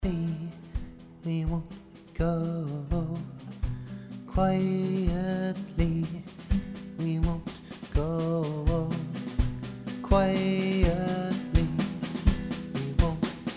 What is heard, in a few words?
healthy old drums